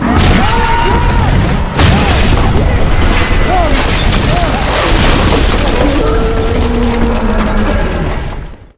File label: future city monster attack; spawngirlsounds